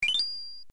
Accept; DKC2

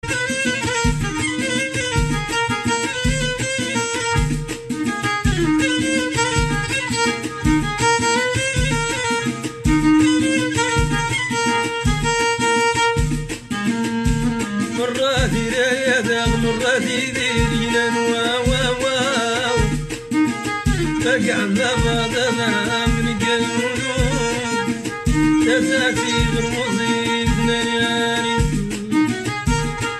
ringtones Amazigh

